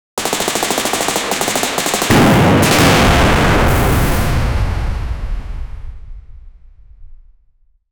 several ballistic missile

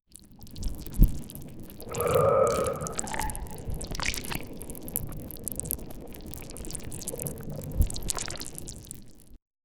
bubbling broth sound, boiling

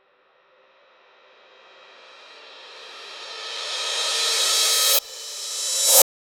a reverse cymbal riser; peak

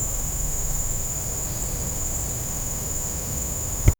the noise of a light, insects

